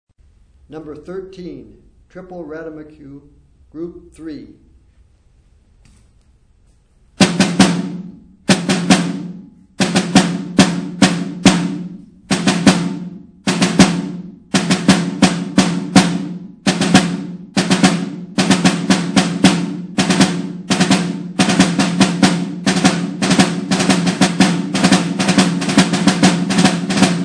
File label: snare rudiment